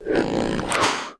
Lizard Man Idle

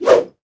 Atk Wand; Seer; GamigoNA

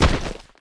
Foot

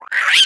Slug die; Seer; GamigoNA

